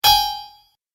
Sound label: Message Error, beep